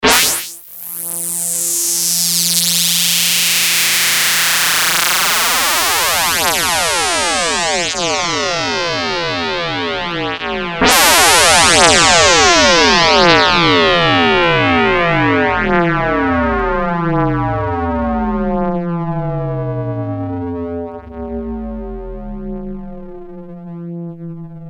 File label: fx ring; Creamware minimax